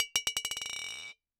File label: Bottle Struck